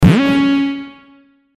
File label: Guitar Gun Shot; Guitar One